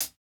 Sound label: hihat, house